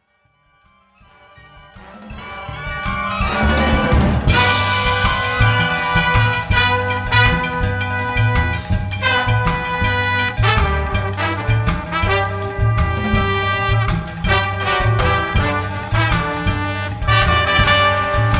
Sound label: place congo melody